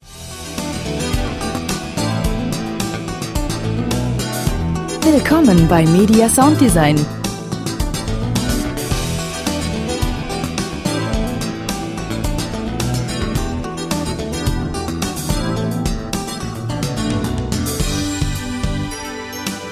rock

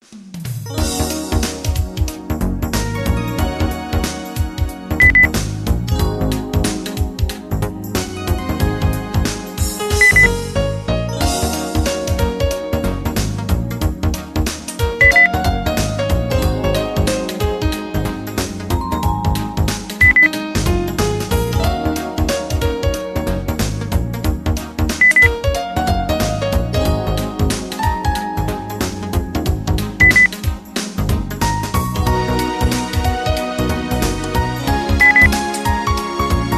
pop

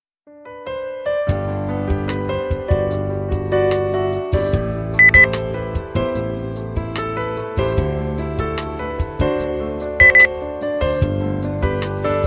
soft

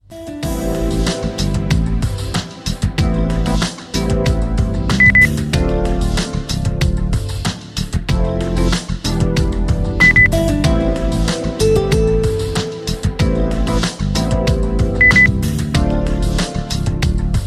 lounge

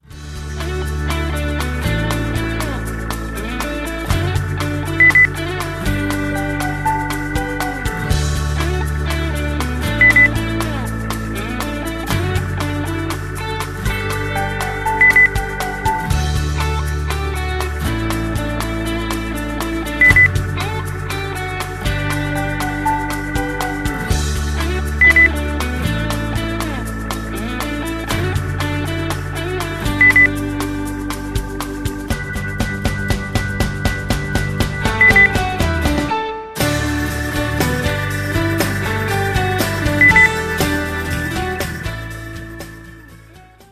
rock, beep

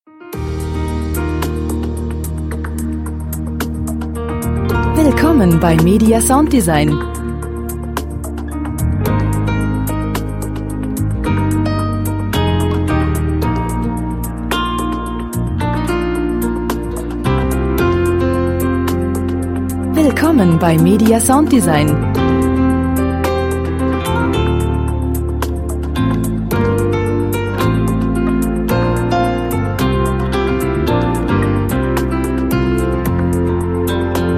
soft

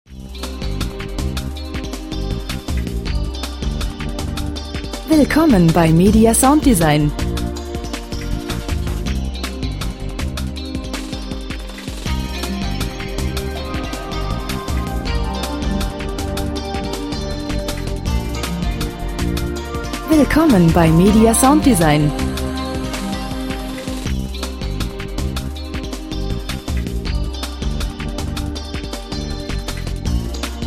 percussion

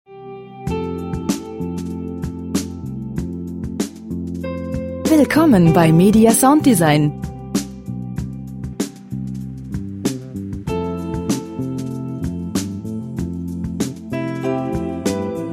soft

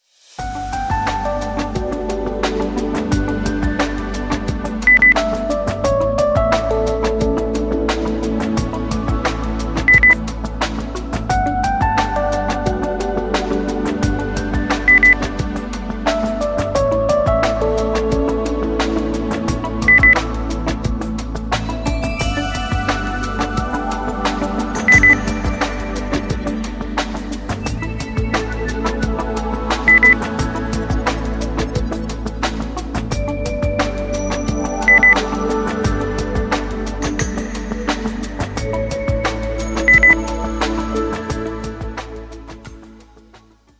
lounge

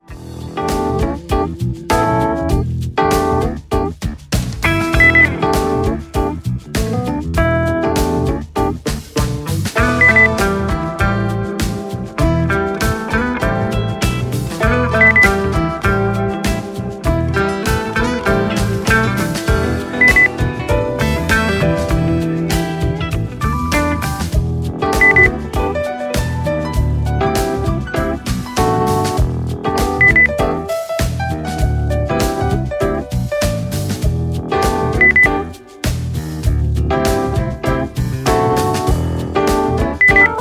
groovy easy going

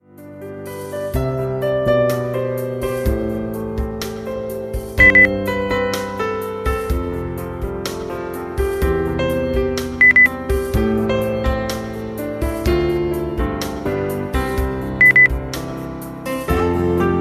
soft

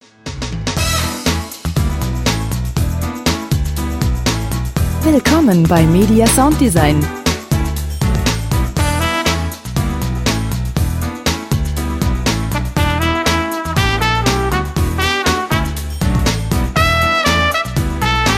jazz thinking jazz

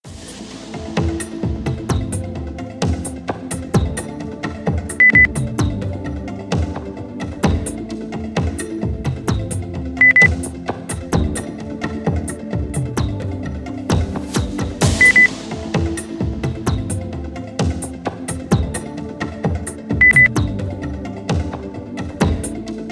percussion amazonas beep

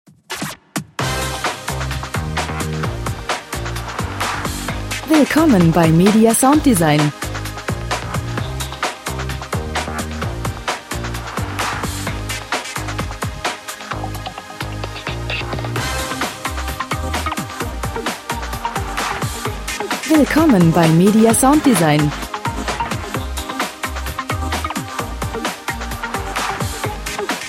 percussion

